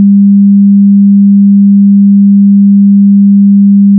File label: carrier